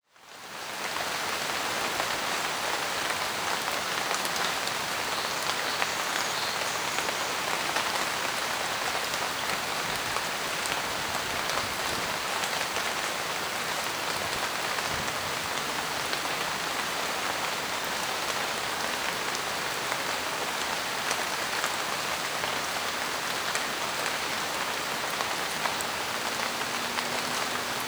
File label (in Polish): odglosy natury krople deszczu; Odgłosy natury